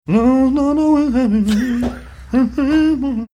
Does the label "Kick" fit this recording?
No